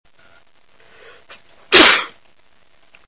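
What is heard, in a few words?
sneeze, male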